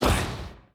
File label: open wings; fallen